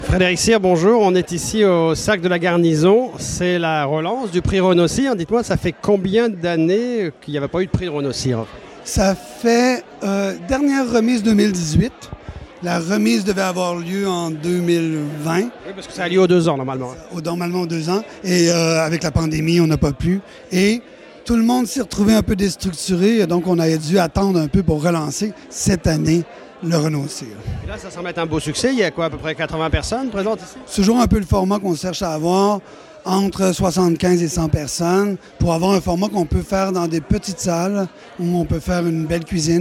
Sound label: Vocal